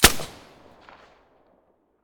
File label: silencer fn2000